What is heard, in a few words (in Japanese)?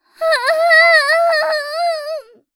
はああああん！, 母親